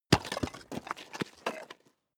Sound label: stone plate drop